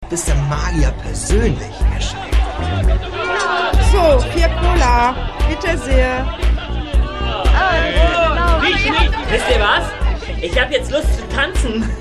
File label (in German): partylaune